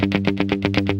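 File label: GUI 120, guitar; Best Service Gigapack 2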